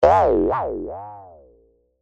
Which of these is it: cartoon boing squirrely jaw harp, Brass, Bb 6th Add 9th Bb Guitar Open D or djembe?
cartoon boing squirrely jaw harp